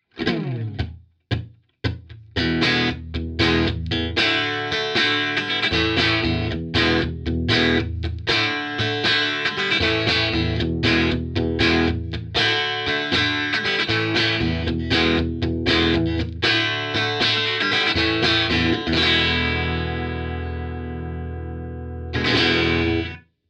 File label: white clean rear